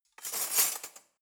cutlery knives drawer place

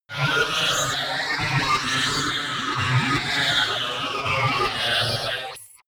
sci-fi machinery

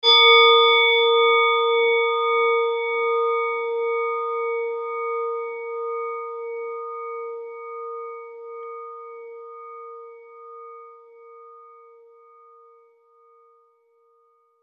japan rin bowl beated

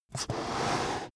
balloon blow

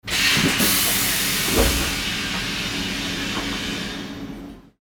train door opening

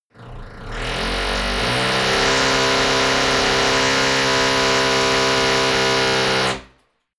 drilling adjacent apartment

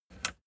plastic button latch release disable

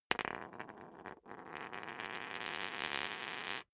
poker chip spin long

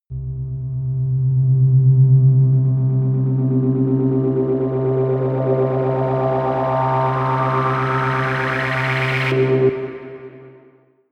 rise hum whir low

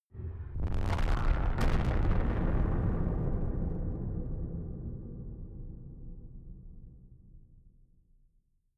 distorted blasts

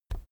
keyboard external key push soft

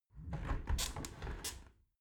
window close soft sequence

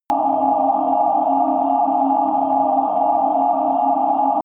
underwater noise deep

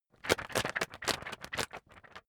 magazine wobble hard

